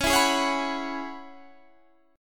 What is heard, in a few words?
Db Minor 11th Db down Guitar Standard; Dbm11 strummed; JGuitar